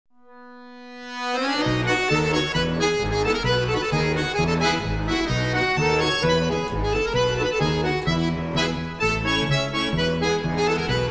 Nationalensemble, Krakowiak; Wei russisches Nationalensemble

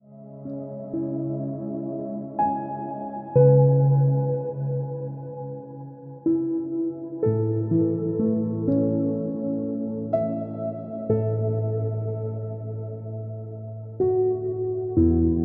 Ambient Music

